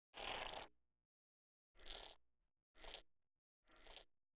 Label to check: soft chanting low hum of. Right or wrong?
Wrong